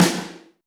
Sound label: snare, Zoom MRT-3B